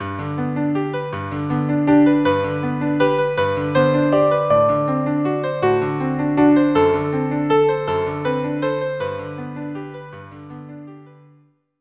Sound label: violin; On wings of song